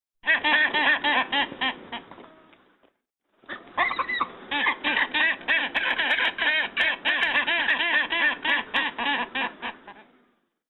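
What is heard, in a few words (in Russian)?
Поморники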